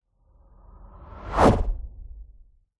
sound design cinematic short whoosh with mod delay fast tail; zapsplat